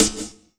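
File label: snare drum, Drum & Bass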